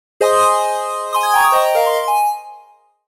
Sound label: laugh; PaxSona; en US